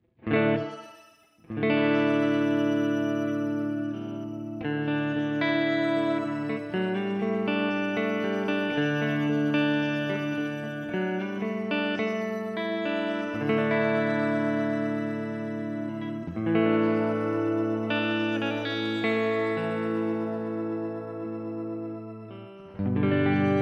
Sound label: Keeley Realizer Reverb Hall